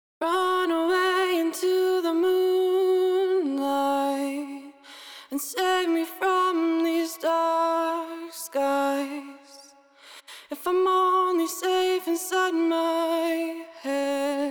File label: Main Vocal WET, Acapella, 90 bpm B Minor; Ghosthack; Emotions- Acapella Collection